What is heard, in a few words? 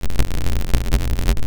synth ripper; ripping sounds; Rip #2